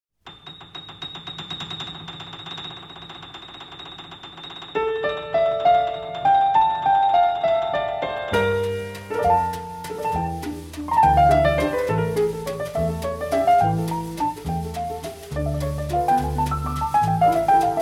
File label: Jazz Piano